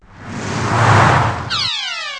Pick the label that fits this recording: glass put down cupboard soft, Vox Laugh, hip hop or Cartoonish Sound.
Cartoonish Sound